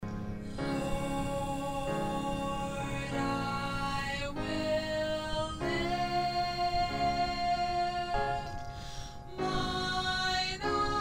Soprano

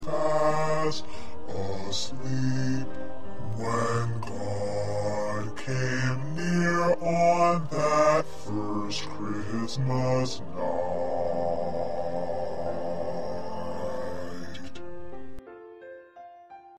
2nd Bass

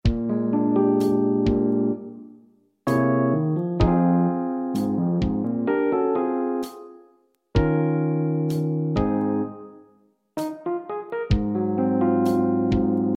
Accompaniment

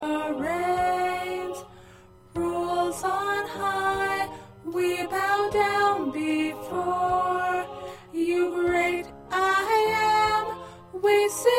Alto

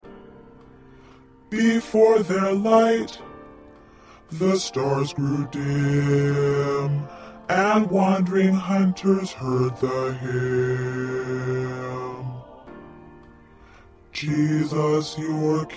Bass, choir

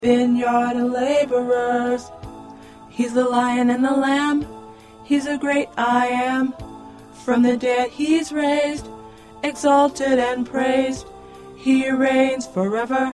Tenor

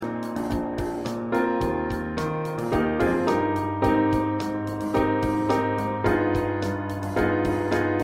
Piano Drum; Kumbaya

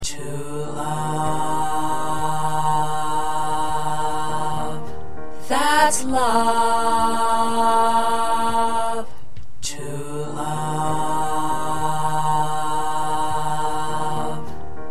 1st Tenor, choir

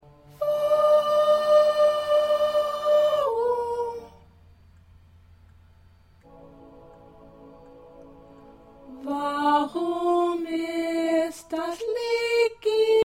Soprano

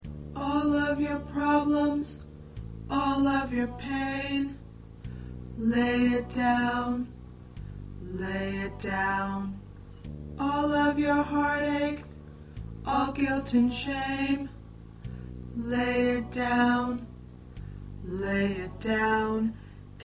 Tenor, choir

